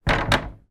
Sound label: household gate wooden close